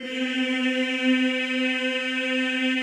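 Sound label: choir C3, Men-Combi; Sound & Vision - Gigapack I CD 2 (Roland)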